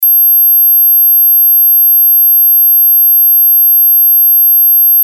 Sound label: 12,5 kHz